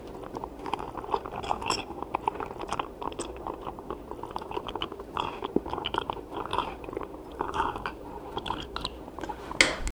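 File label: drinking coffee, mug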